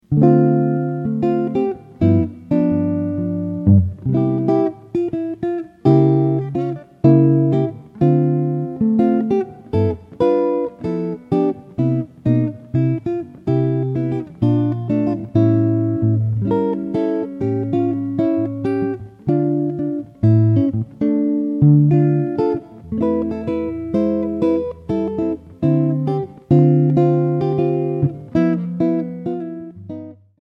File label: Jazz; Building Jazz